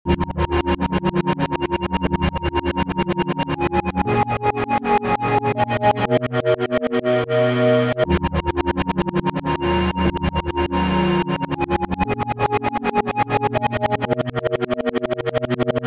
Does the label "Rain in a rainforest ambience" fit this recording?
No